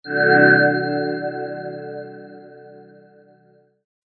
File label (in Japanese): 光魔法 中 モノラル